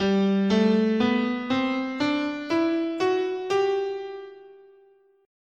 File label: G Ionian, scale